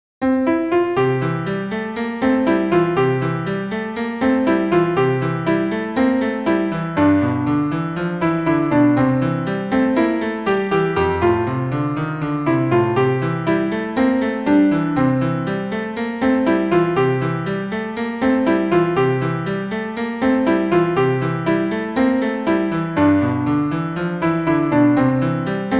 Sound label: When the Saints Go Marching In - piano arrangement